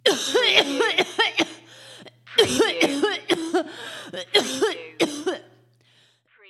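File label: Female Coughing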